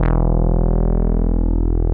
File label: ANA BS, SYNTH BASS